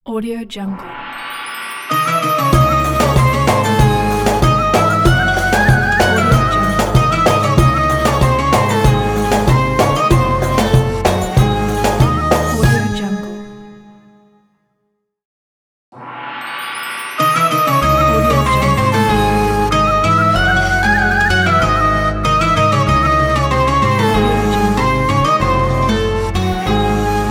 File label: the ramadan logo